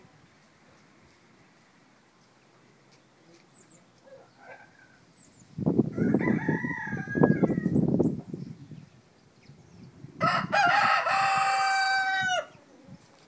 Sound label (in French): Cocorico